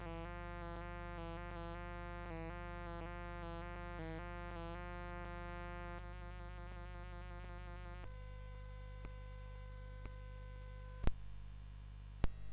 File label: CSG8580R5; Roger Ambill